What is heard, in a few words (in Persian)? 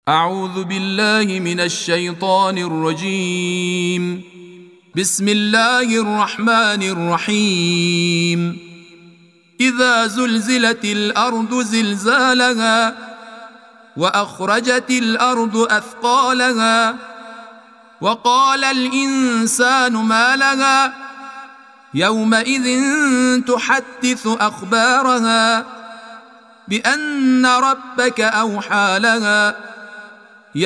تلاوت ترتیل قرآن کریم سوره زلزله